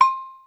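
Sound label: Agogo Low, Yamaha DD-35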